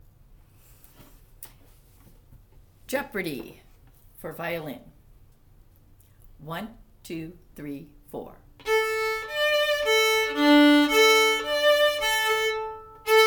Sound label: violin